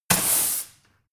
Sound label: broom sweep close